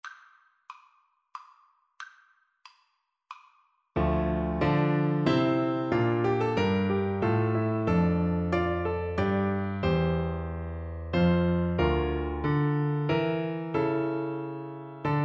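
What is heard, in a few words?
recorder karaoke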